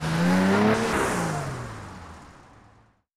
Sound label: car fx 120bpm